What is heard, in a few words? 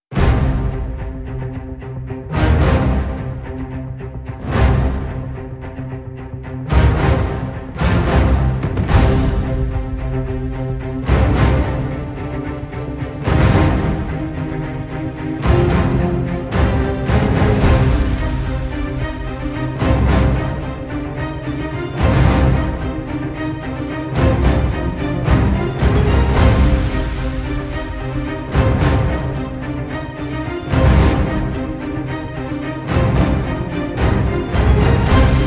No Choir